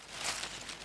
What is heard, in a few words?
leaves; The Sum of All Fears